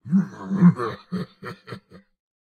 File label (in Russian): Смех; Турбосвин